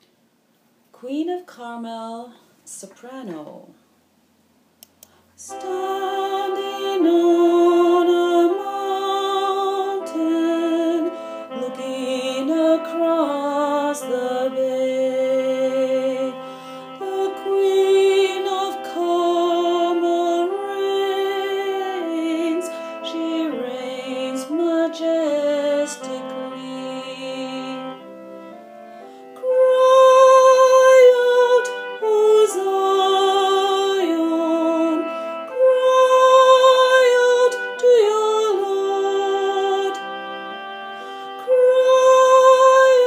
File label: soprano, choral music